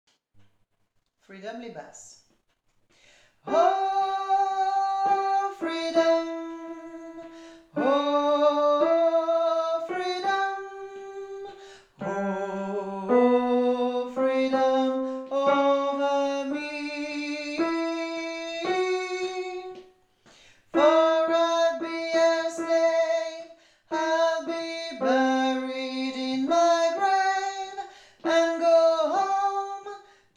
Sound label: freedom basse